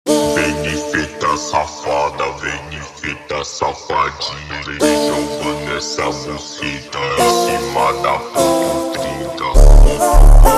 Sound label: Montagem super Slowed